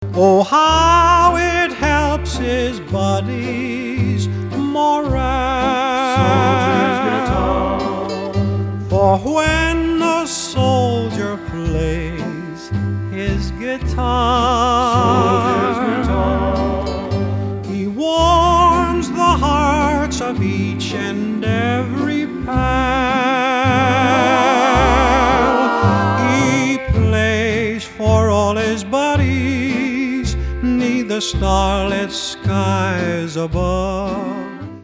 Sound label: Guitar